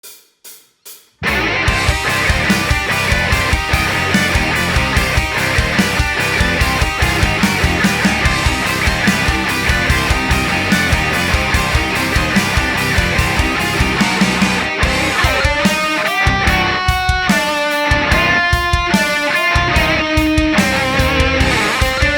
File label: Rock Cover; Mario Kart 8 Deluxe